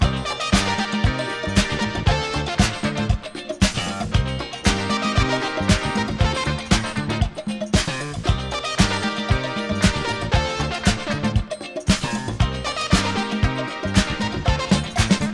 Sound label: Instrumental